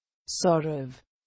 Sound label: robot